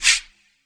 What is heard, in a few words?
shaker one shot e key; percussion